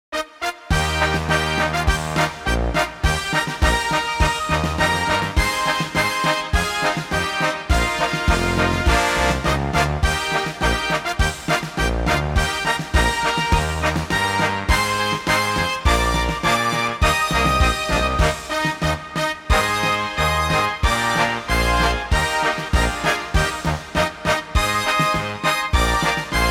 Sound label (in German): Narrenmarsch; Holzbirregueggel